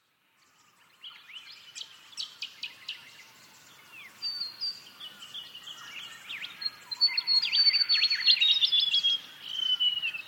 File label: Birdsong